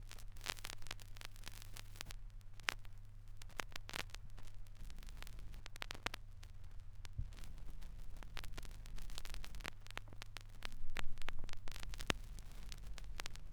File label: crackles, Vinyl